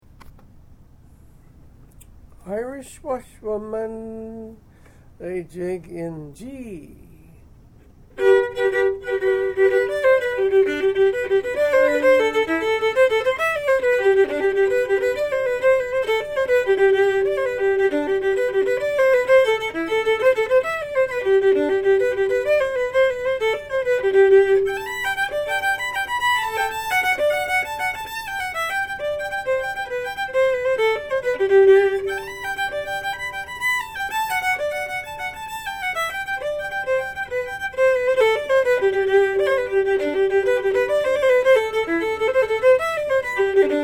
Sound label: Jigs, Fiddle; Fiddle chords